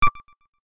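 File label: short sound of a single pulse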